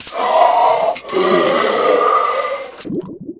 DROWN; opentdm; cyberdemon

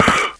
jump; mercy

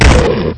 fall; opentdm; Fwwolf

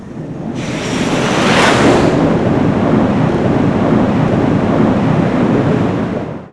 flyby

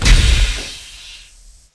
door

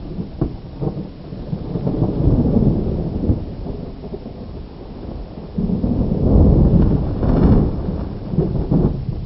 storm

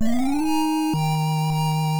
level; baseq2

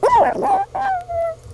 DROWN; hamster

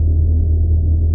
rg hum, weapons

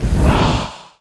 fire cast

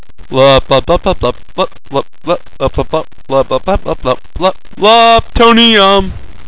DROWN

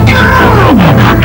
pain; bruticus

